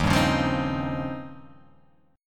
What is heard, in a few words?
D sharp 13th D sharp